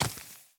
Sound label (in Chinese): 尸壳脚步声